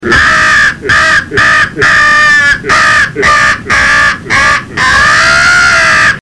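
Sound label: distress, The Distress Call, crow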